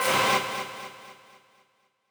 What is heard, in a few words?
stab